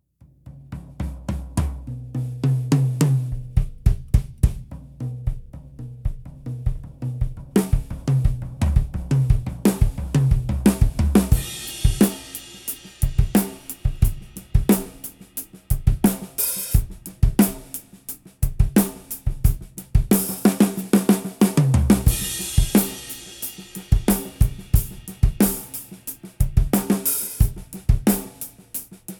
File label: Sonor SQ1; Ambassador